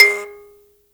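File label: buzz kalimba G#4; Heart of Africa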